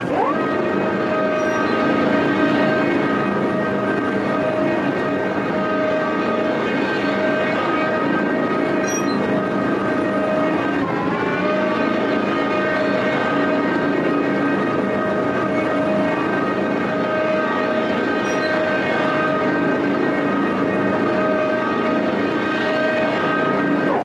Avenger missiles systems turret rotating. Weapons, Artillery Missile Turret Military Equipment